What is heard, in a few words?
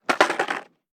skateboard hit; Nick Summer Sports Stars